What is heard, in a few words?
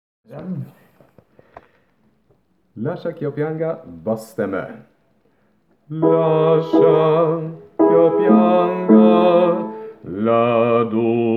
bass; Lachi chio pianga